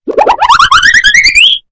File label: goofy ahhh; phx new taunts pack 2